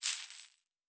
Chain; Fantasy Sounds Bundle; Craft

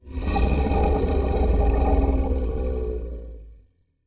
Large Creature Long, Monsters; Fantasy Sounds Bundle; Large Creature 13

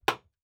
Nail; Fantasy Sounds Bundle; Craft

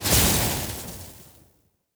Lightning Spell, Magic Sounds; Fantasy Sounds Bundle

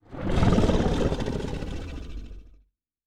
Growl, Monsters; Fantasy Sounds Bundle

